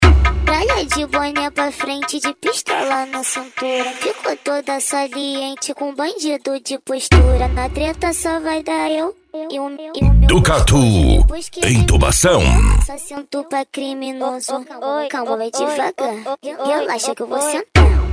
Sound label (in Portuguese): funk agressivo